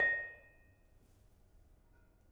Bonang Panerus Sl 6 soft, gamelan; gravityharps; timbrebox

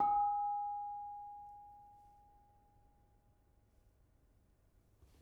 Bonang Barung soft, gamelan

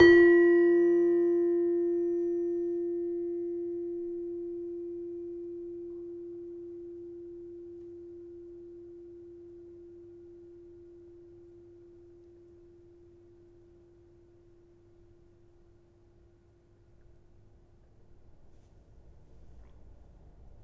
Demung, gamelan; gravityharps; timbrebox

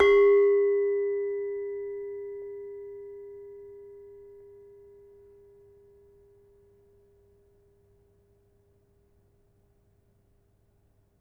Demung, gamelan

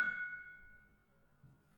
Panerus 5 F Sharp, gamelan tuned

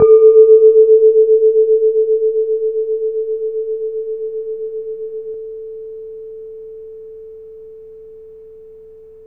calung, gamelan; gravityharps

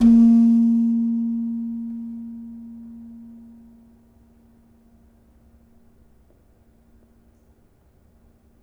Slentem hard, gamelan; gravityharps; timbrebox

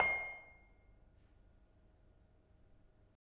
Bonang Panerus soft, gamelan

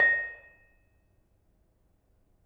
Bonang Panerus, gamelan; gravityharps; timbrebox

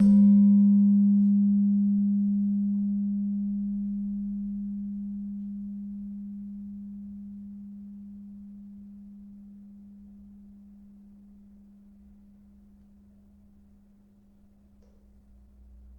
Slentem 3G Sharp, gamelan tuned

